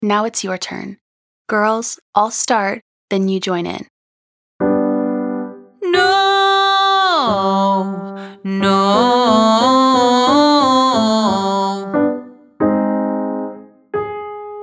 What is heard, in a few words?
No Descending Octave Slide to Bouncy Scale Female